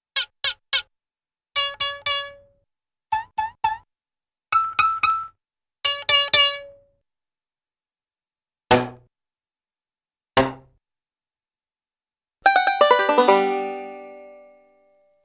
Small Twangs - Bass String Pluck - Banjo Arpeggio; Cartoon; Hollywood Edge; Cartoon Trax

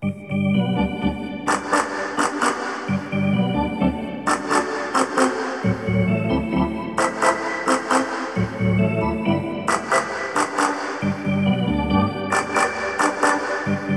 Hockey Organ

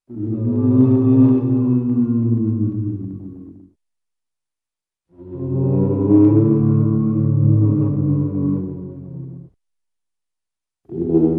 Spooky Ghostly Moans, Cartoon; Hollywood Edge; Cartoon Trax

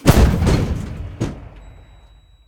Gun, Tank, Single Blast With Rebound; gun and weapon sounds; captain audio; fully loaded

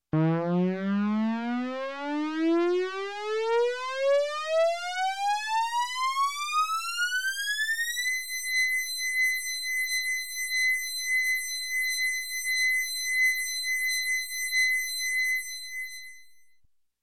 Space Air Raid Siren, Sharp, Electronic; Sound Ideas 2000 Series

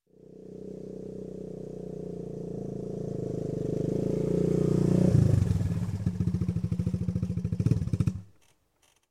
Motorcycle, ATC Pull Up, Shut Off; Sound Ideas; Series 2000

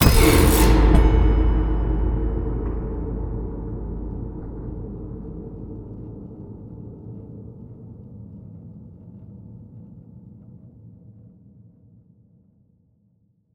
strum slam, Cinematic Metal Impacts

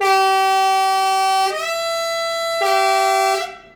train horn; best service Blue Box

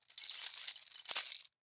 Chains, Horror Effects

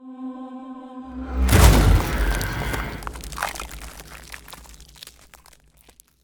Ice Spell Mobility Teleportation Icy Step; Mobility & Teleportations; Arctic Aura

